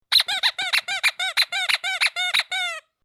Funny Rubber Toy, Cartoon / Comedy, a very silly rubber toy; a very silly rubber toy squeak sound being squeezed repeatedly; Miscellaneous Cartoon Sound Effects; Lynne Music